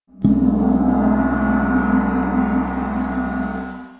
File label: gong